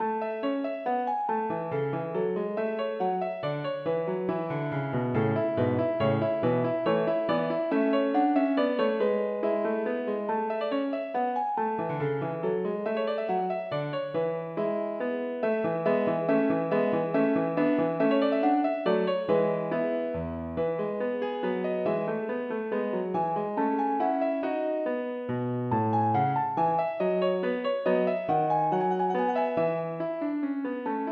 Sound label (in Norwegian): Menuet for piano